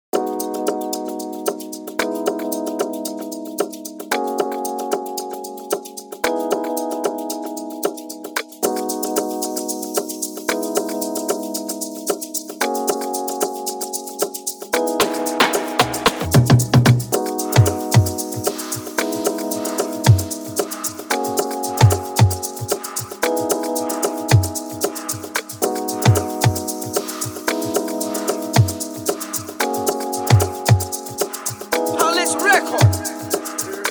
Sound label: amapiano beat intro